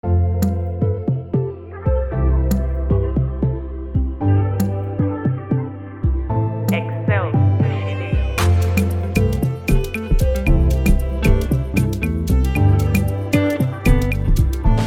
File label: Freebeat Gospel Dance Instrumental